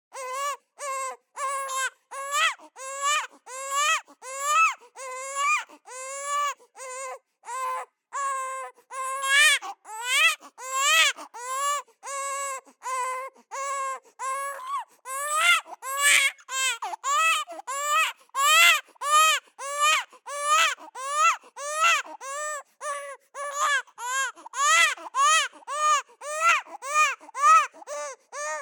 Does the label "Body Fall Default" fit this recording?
No